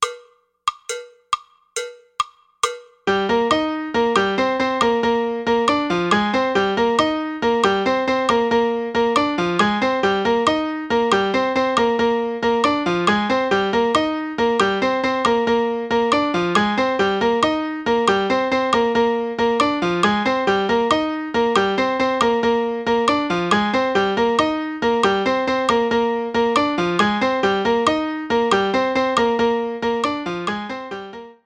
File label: son montuno fast